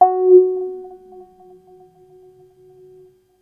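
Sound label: bass F#3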